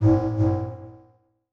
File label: Transition Hit Impact Warble; Adobe; Production Element